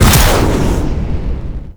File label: Jetpack Melee Launch Up; Warframe; Veilbreaker; Sprag